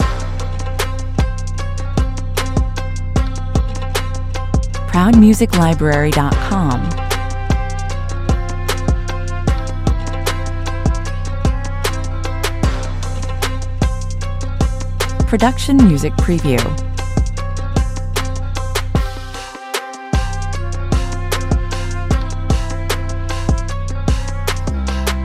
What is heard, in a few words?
trap loop